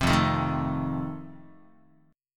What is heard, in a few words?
F 7th F down Guitar; F7 strummed; 3,1,0,1,1,x